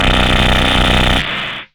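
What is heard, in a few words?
RUMBLE left, Hard & Loud Techno; USB Soundscan vol.01; AKAI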